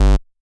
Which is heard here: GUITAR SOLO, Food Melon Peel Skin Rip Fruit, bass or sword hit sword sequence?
bass